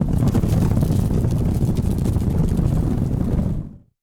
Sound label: Horned beetle; Super Mombo Quest